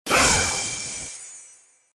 Barbarian Skill

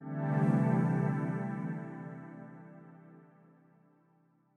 intro pad

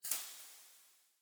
museum door

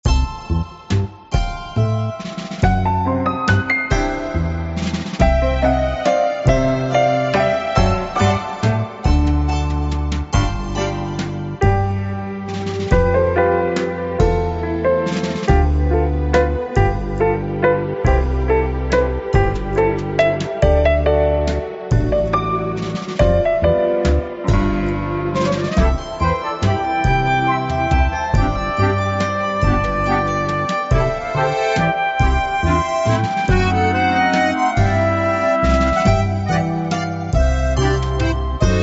Ingredient Level

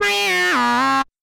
Beat

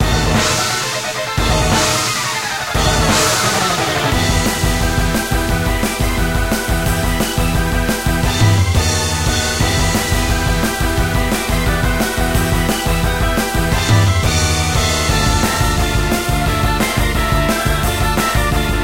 Tense; Glorious Savior

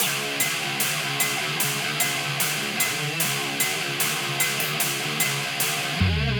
boss Start; Speed Overflow

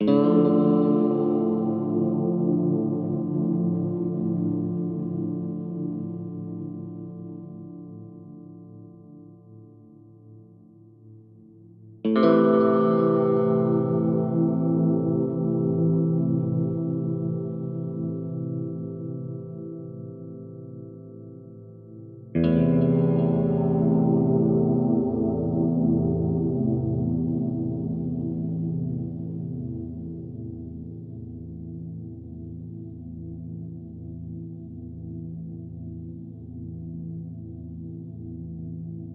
guitar; Void Bastards; Krell

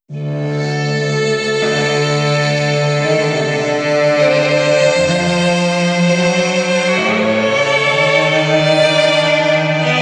Fire Gramophone; Zombie Tomb - Gramophone

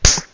Slap; Talking Tom Cat

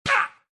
Pain hit guy; iStunt 2

